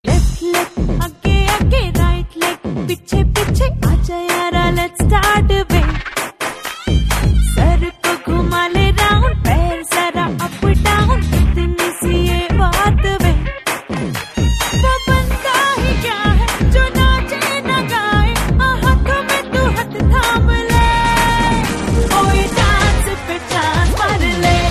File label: Dance